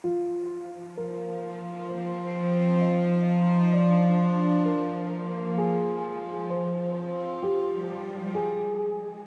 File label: Piano Arp; Solos